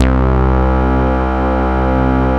CS-15, BASSES

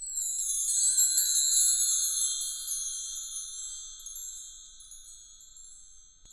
PRC W.CHM, Orchestral Perc; Roland LCDP03

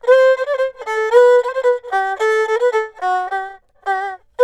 ERHU 110 G; INIS - Opium

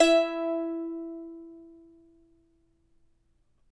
charango E3, guitars; Spectrasonics; Hans Zimmer Guitars VOL-2

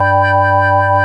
ORG Vox G, Continental Vox Wah Bar; Roland LCDP09 Keys of the 60s and 70s 1

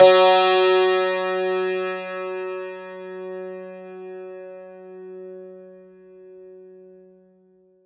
STR, SANTOOR; Best Service ProSamples vol.52 - World Instruments 2; AKAI

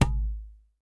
WD L, DRM HITS; Spectrasonics; Liquid Grooves; WAVDRM HITS1

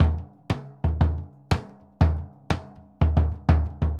Bombo, Festa Latina, Drums & Percussion; Best Service; Festa Latina VOL-1

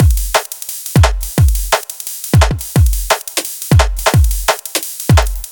Drum Loops, 174; M8; Electronisounds

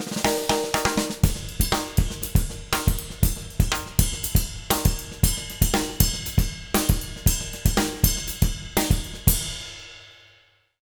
120 JROCK, Drumloops; Jazz Latin; USB Soundscan vol.08; AKAI

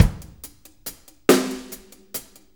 6-8 Loop, Full Kit Loops; Drum Tools

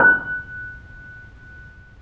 The Piano, F#; InVision Interactive - The Piano; BOESINGSOFT

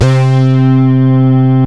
Synths BASS

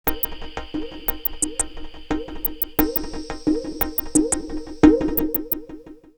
Fission 88, drumloops DrumMachines; X-Static Goldmine

